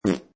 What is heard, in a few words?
Cartoon Fart Basic, Good old, straight up fart.; Comedy/Cartoon; Cartoon Sound Design Sound Effects